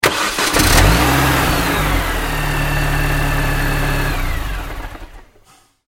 transportation car start off external mini cooper bmw; Car Start Then Engine Off - External Recording - Mini Cooper Bmw; Car Start Then Switch Off; Vehicles; McKinneySound